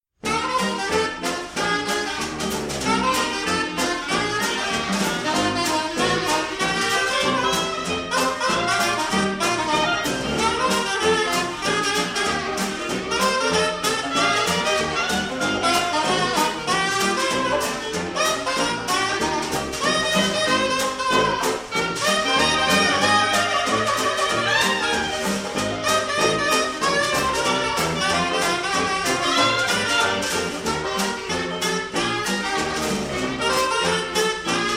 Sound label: Washboard Band